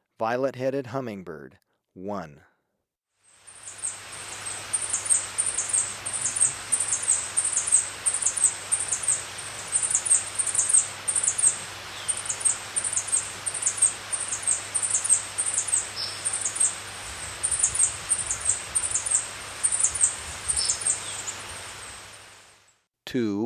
Violet-Headed Hummingbird, Birds of Ecuador; S. America

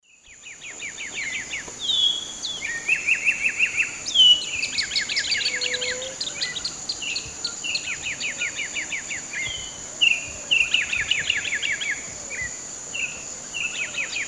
Arremonops conirostris, song; S. America; Eastern Lowlands

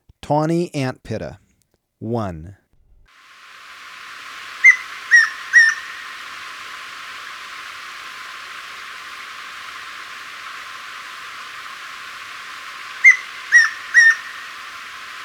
Tawny Antpitta, Birds of Ecuador; S. America

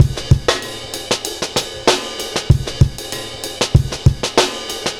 Drm20 hip hop, Drums

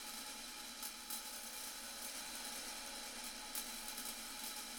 Cymbal Rain, Psychotropic Cymbals; Ambient Cinematic

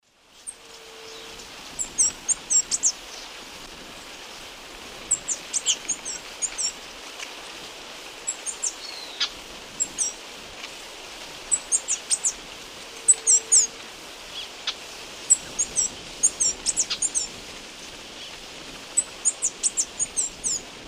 Thlypopsis sordida, song and chatter; S. America; Eastern Lowlands

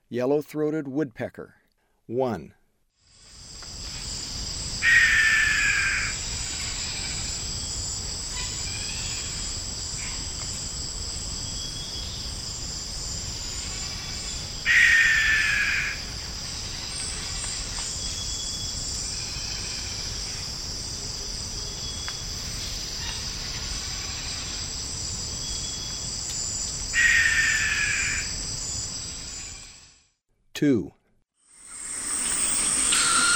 Yellow-throated Woodpecker; S. America; Eastern Lowlands

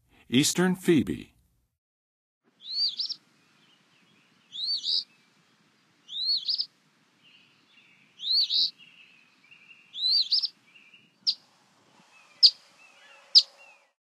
Eastern Phoebe, Songs of Birds; North America; Western USA; Western USA Songbirds

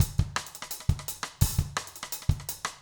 jungle

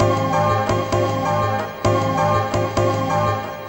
Synth, Techno

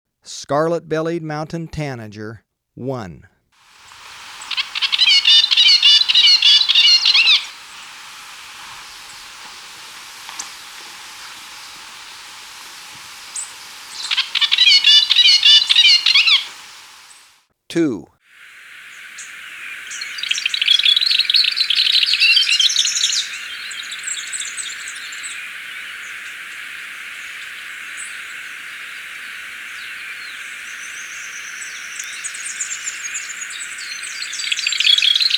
Scarlet-Bellied Mountain-Tanager; S. America

